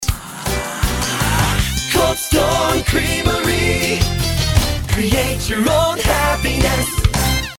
ice cream shop jingles; Coldstone Creamery

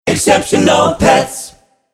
Vocal, acapella jingles; Exceptional Pets

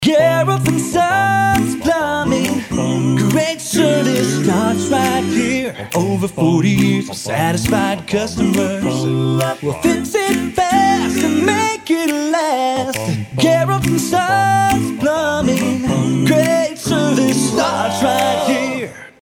Plumbing, acapella jingles; Garrelts Son

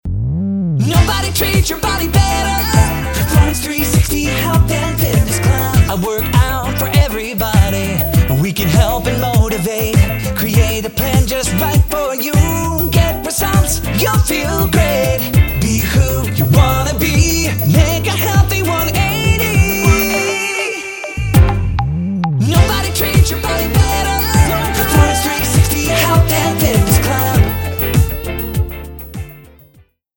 Full Sing, fitness center jingles; Performance360

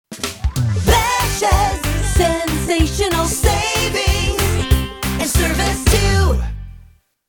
Grocery, grocery store jingles; Bashas

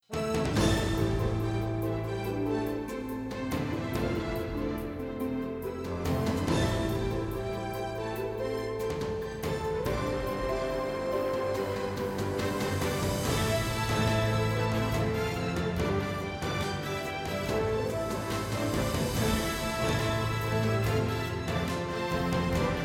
Orchestrated; orchestrated jingles

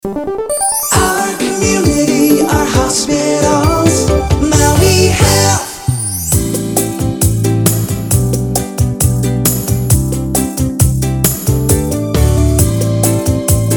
urgent care jingles; Maui Health

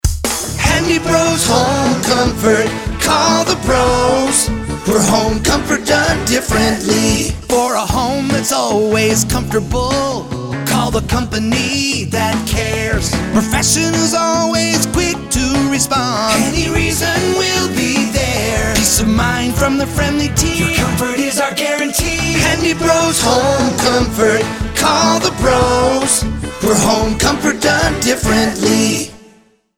Full Sing; Handy Bros

